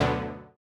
Housey Stab D2; KG MegaSaur

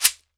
SHEKERE, African Perc; E-MU Producer Series Vol. 7 – Old World Instruments

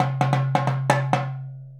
Indian Drum; Sonic Foundry (Sony Creative Software); Essential Percussion; Drums

